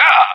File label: whack smash; npc; source engine; left 4 dead 2; moustachio